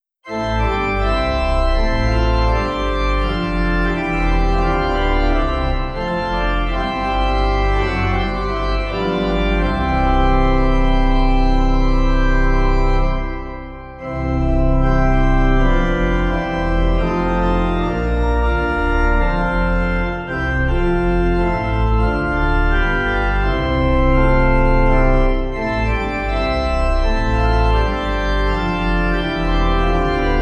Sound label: Hymn, organ